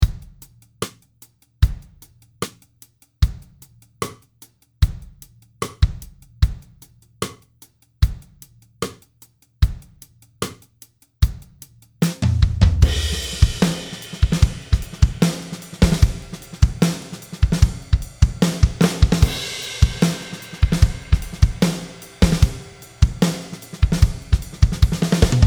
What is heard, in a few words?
Redline Reverb Ambience Snare Reverb